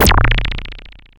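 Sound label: FX right, attacks; Best Service Advanced Media Trax Modern Composer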